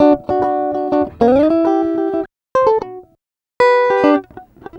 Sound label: Acoustic Guitar 100 bpm D, African Guitars; Quazibeat - African Secret